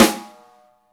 SNR, Snare; Club-50 - Foundations Roland; Ext.Snare

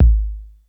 BD Eight Snappio, DRUMS; Polyend; Legowelt

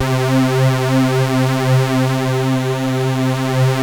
JUN 106, BASSES; Masterbits - Vintage Voltage; VV BASSES

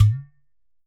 GHATAM

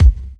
Kick, Kickdrum; Equipped Music; Loungin House

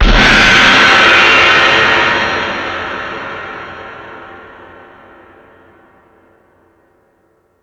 Space Drums, Drum Machines

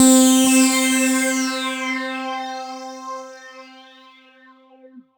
C4, TECHNO FX, Roland JV 2080

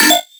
synth hit Computing Noises; Virtual Riot

